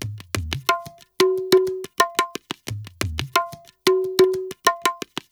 ETHNO EXTRA 90; ethno2

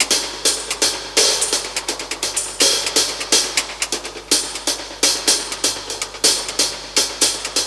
125 Classic Breaks, 125; Touch Loops; ClassicBreakSessions

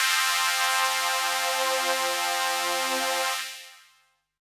ELECTRO PAD 140 A; Keys

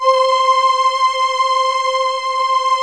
VP330

